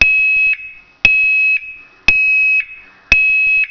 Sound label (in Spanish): tono